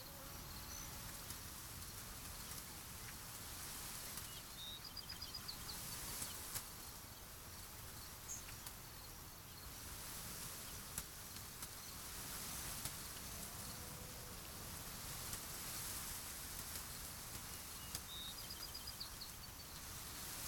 ambient forest

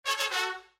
Windows Hardware Fail

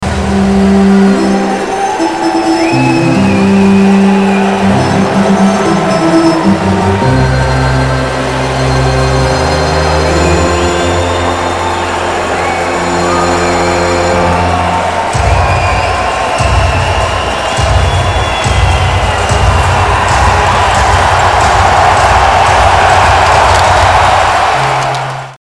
ORGAN; OLYMPIC

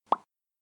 POP

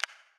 exclamation

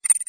Device Disconnect

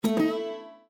Hardware Insert; Windows

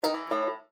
Windows Hardware Remove

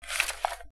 On empty trash

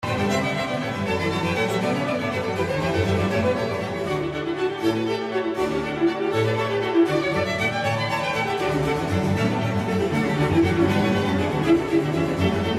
Allegro

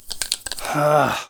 device error

